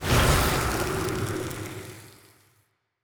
toxic planet, ambient; Stellaris

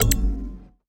ui holograph in; Stellaris; paragon

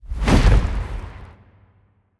large wing flap; biogenesis

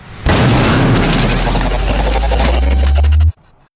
event robo; Stellaris; synthetic dawn

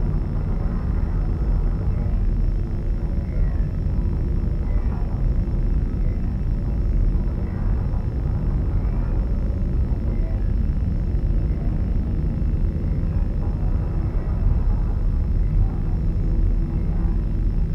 ship destroyer idle; Stellaris; shroud; ships

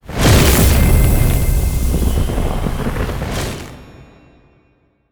relic activation generic; ancient relics

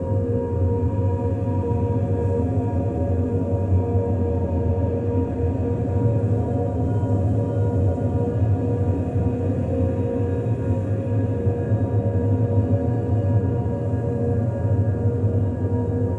gas giant ambient hum; Stellaris

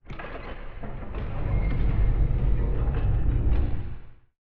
ship colossus close; ships; Stellaris; shroud

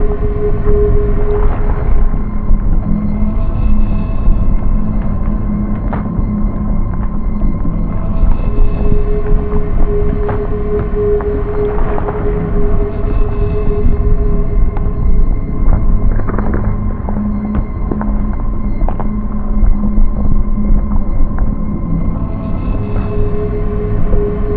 amb mining station idle; necroid; Stellaris; necroids

